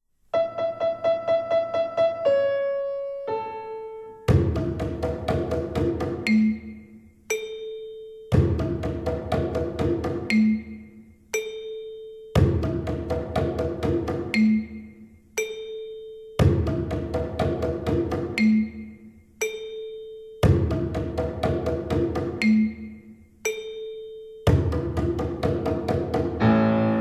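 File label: The Fifth Twinkle Rhythm, Violin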